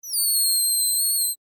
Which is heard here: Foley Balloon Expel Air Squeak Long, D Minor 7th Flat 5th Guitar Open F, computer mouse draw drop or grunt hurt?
Foley Balloon Expel Air Squeak Long